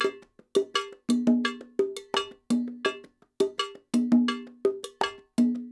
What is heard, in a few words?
Percussionworks